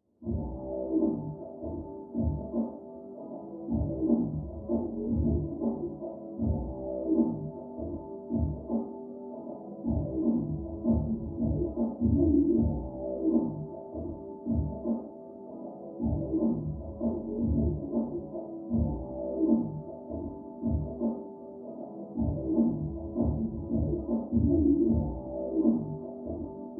Hip Hop Beat

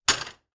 frying pan slam

